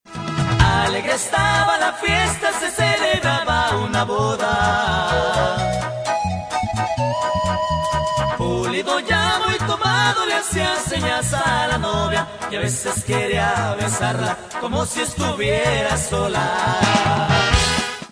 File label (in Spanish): corrido